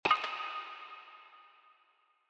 reverb size100

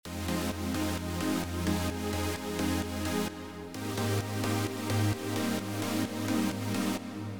chord

